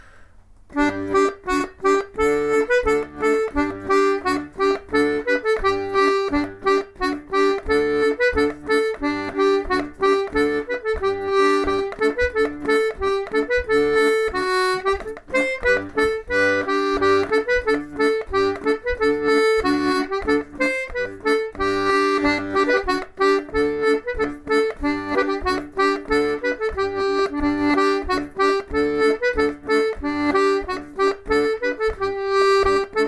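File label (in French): maraichine, accordéon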